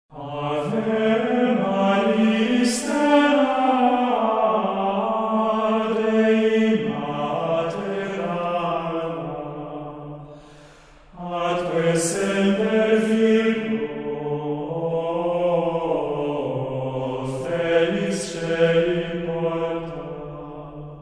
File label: CHANT - ave maria stella